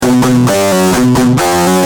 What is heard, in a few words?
G Jam, Guitar; Industrial